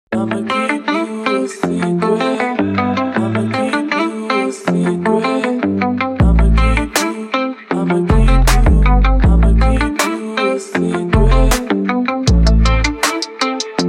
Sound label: trap beat